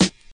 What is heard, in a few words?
smooth acoustic snare sample F sharp key